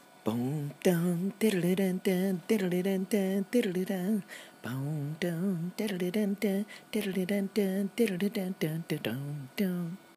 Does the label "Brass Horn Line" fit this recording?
Yes